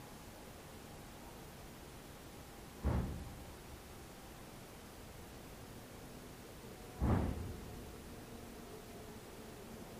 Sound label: bang 4th floor